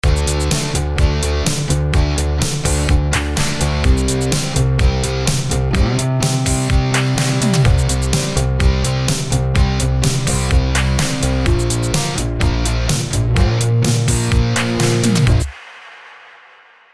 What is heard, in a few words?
Avenger Synthwave; Power of Rock